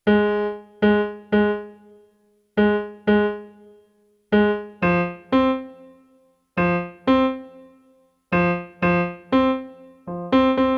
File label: JunoDS88 Rock PNO ARPs